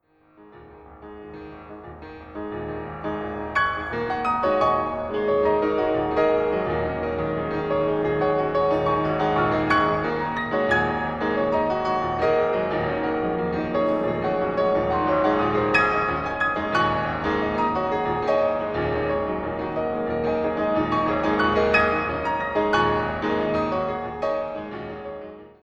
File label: Maestoso Allegro accento